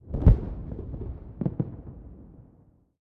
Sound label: ambient port; World Of Warships; port3d